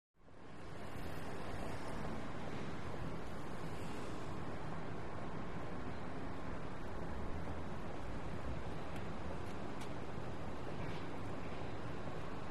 Traffic Bys Then Pauses. Two Truck In And Bys, With Squeaks And Rattles On Away; wet traffic